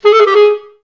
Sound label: G#3, orchestra; Best Service; Peter Siedlaczek's Advanced Orchestra